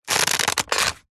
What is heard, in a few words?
plastic creak stress; foley